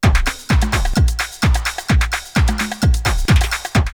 Floor 2 Floor 129, drumloops, Rave; X-Static Goldmine; 90 s Sample CDs

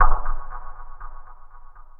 Echo Noise Burst; Sonic Foundry (Sony Creative Software) - Futurist Drum & Bass; Drums & Percussion

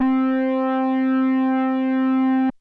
ANALOG, BASS; Best Service; Hyper Dance

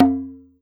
TUBANO

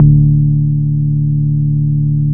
BAS, Bass Analog & Synth; USB Soundscan vol.12; AKAI

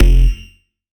Bass F, Bass Synth

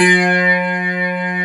NASTY CLAVI, DANCE

